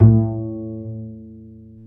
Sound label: Bass, Roland